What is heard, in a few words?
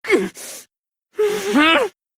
Grunt